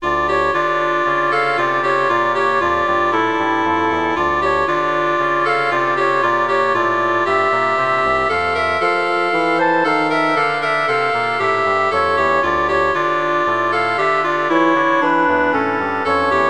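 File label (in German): spielmusik flöte klarinette fagott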